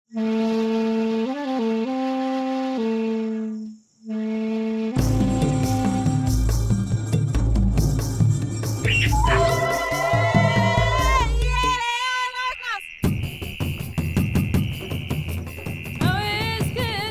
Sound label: Ethno africa